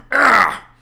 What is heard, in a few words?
argh